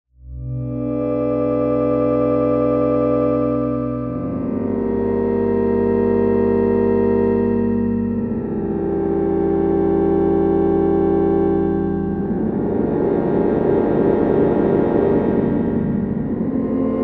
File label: horror score